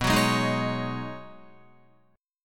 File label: B 6th Add 9th B Guitar, chord; B6add9; strummed